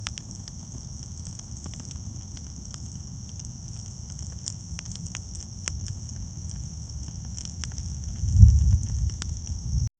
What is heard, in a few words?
crackling fire sizzling m; roasting